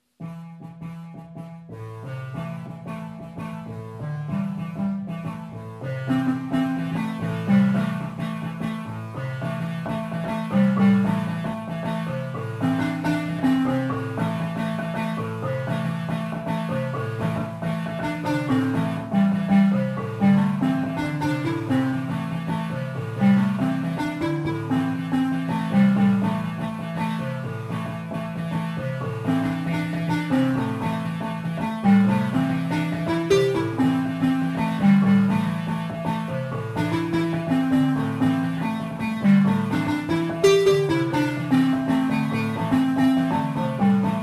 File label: Balafon Groove